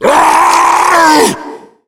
tank fire, voice pain

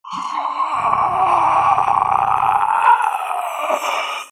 female zombie idle; nmrih; zerosiki; slender

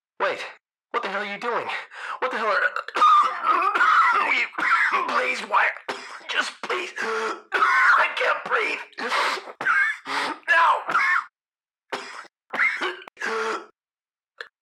death; freak fortress 2; scp035

